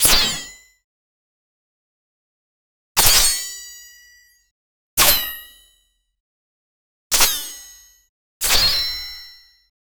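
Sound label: a knife swoosh through air; 32 bit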